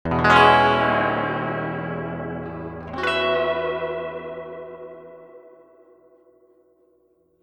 reverb tank 2 spring short tremolo chords

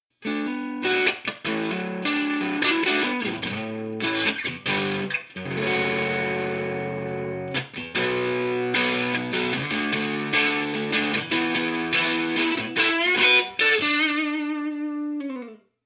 overdriven

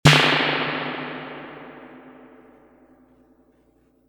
reverb tank 3 spring long drum machine snare

